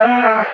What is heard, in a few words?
vocal signal Bm; SD AURORA Sample Pack; Slate